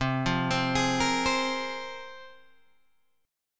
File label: C 7th Suspended 4th Sharp 5th, Guitar, Open G